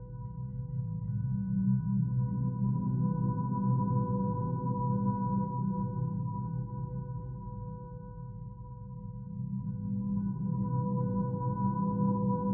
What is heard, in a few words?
ambient galaxy map; Stellaris